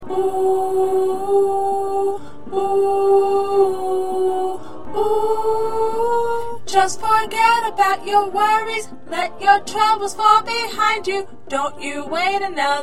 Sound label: 2nd Alto